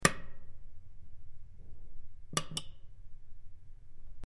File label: Button Old Button Pre, switch clicks